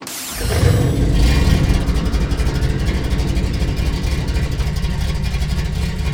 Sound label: v8 start loop; delorean